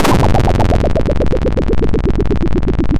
pop

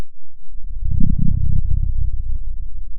Sound of a dinosaur

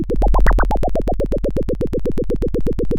Sound of a helicopter

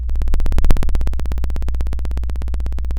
Sound of a chainsaw

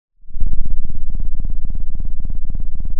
pop, Sound of a cat purring

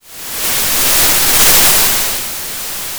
Sound of waves crashing on a shore

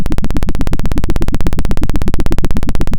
pop, Sound of a helicopter

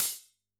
Hf Hat, drum

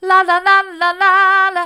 la la hard, vocal

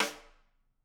Rim, drum

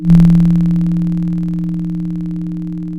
Sound of a church bell

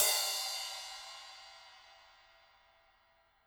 Trash

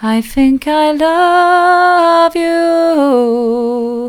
soft, vocal

